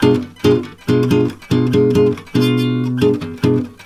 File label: B DIM GTR, Brazil Bossa; USB Soundscan; AKAI